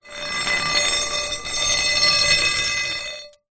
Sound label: foley metal rod scrape on ground